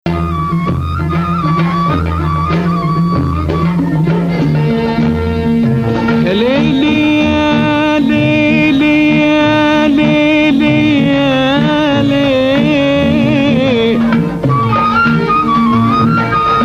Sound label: Bayati Rast